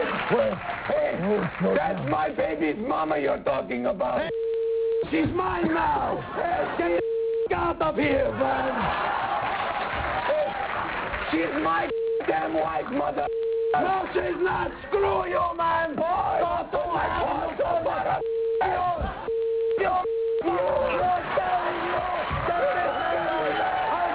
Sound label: beeeep